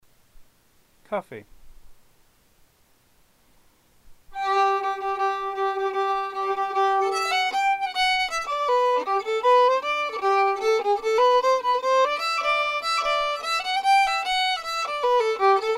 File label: fiddle; festival tunes